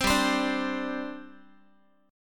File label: G Suspended 4th G down Guitar; Gsus4 strummed; 15,15,15,12,x,x